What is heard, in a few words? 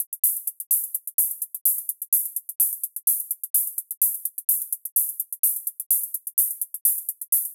tech house hi hat loop 127bpm